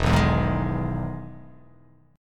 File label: E Major 7th E down